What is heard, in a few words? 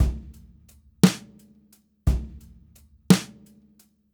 Brushes SN HH